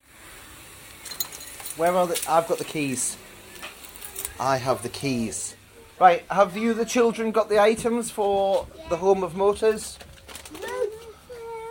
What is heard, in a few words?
soundscape with narration